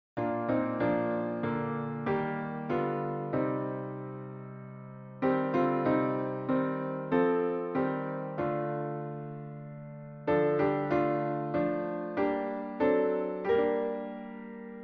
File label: piano Ending